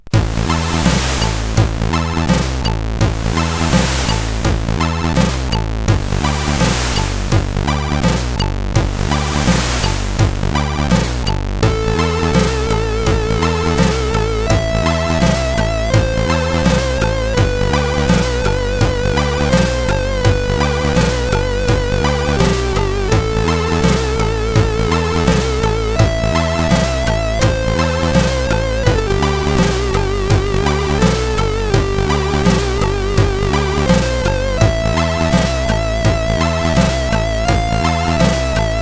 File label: Dream Comes True, sid CSG8580R5